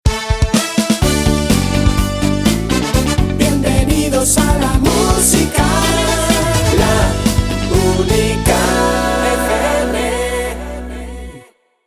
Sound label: Pop